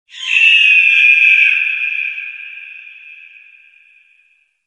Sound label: Leap Up